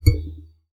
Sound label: Classical Guitar Extended Technique